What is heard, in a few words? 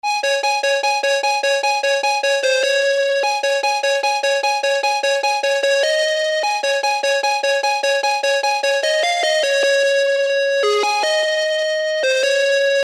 Lead Chain